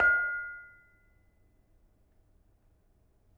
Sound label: Bonang Panerus, gamelan